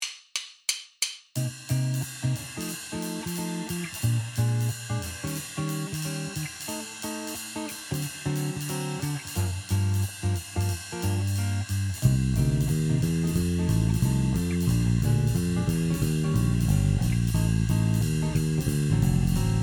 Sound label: bass